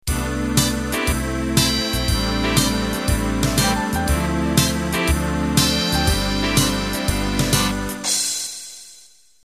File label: Fox Trot Intro; Fox Trot 2Y